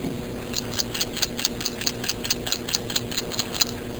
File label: rattle bowl